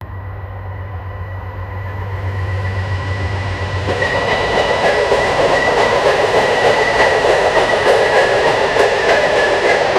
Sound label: an approaching train